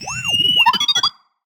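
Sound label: Sci-Fi Droid Robot Emote; JASEM: Just Another Shooter with Electronic Music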